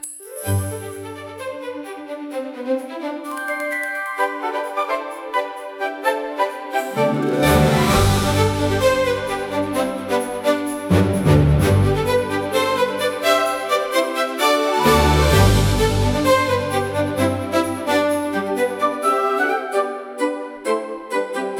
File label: fantasy music cute bright; disney style; fun fantasy world